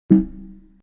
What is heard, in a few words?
response negative; inlogic-checkerslegend